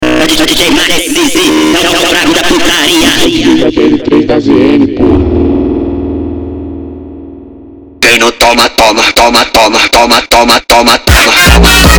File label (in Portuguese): Vozes Profundas Remix; Montagem